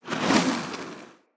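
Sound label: Corrupted Slug Thorn Projectile Whizz By; Creatures; Abilities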